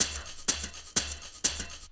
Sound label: Percussion Loops, Shaker & Tamb; Houseworx